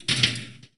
Pinball Drain Lose Ball

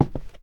boots wood; Apotheorasis

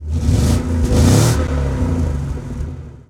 Truck Explorer Rev; SFX Kit; Trucks

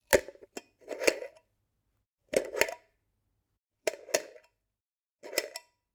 Generic Click Plastic Small

